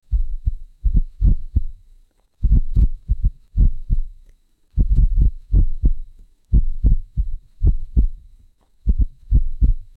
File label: slow heart beat; sound effect